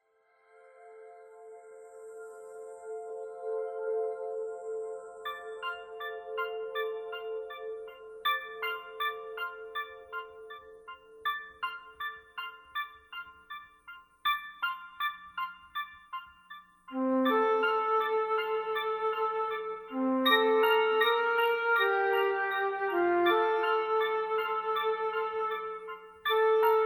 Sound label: ocean laugh